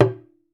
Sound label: African Drums; DON-DON MU; E-MU Formula 4000 Series Vol. 4 – Earth Tones